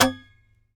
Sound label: SAZ TAP; Spectrasonics; Hans Zimmer Guitars VOL-2